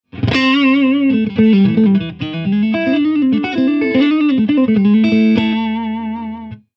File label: EL34 clean boost